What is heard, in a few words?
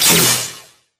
imp bullet lightballistic shieldwall sizzle